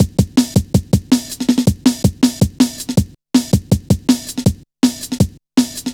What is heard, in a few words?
drum loop, 161.5; Dinki; Jungle